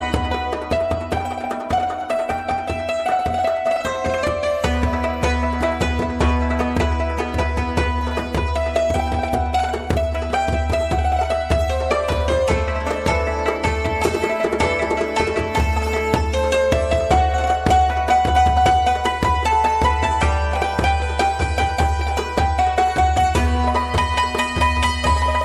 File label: Oriental